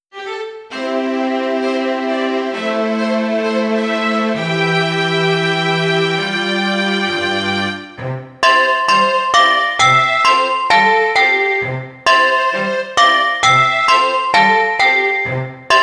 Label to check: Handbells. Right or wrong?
Right